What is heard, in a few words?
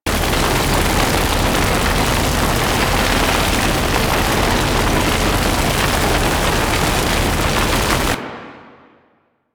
Elemental Breath Fire Impact Loop; Warframe; Dragon